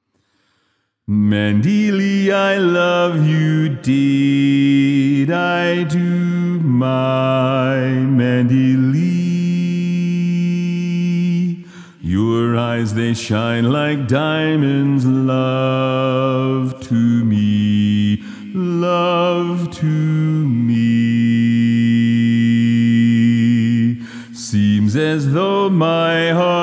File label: Bass